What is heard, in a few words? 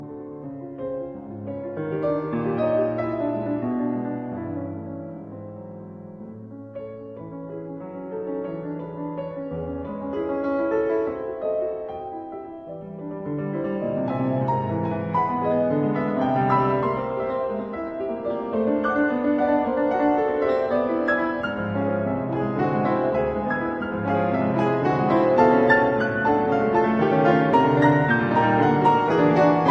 Preludes in A major Andante moderato; Op 103